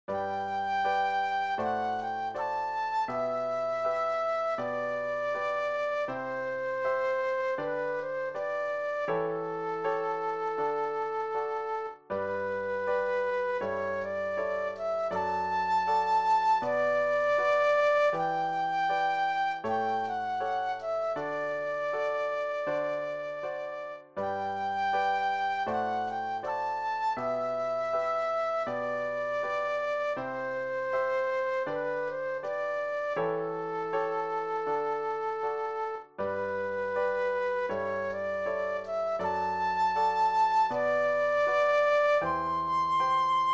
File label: Flute Solo; classical